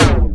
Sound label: gun; colobot